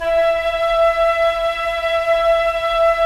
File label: Flute, Roland LA Composer